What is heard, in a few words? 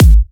Trance Kicks, Bassdrums